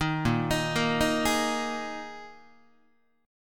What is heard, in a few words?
Bb 7th Suspended 4th Bb Guitar 4A,4E,5A,5D,5G sharp,6C sharp; arpeggiated; JGuitar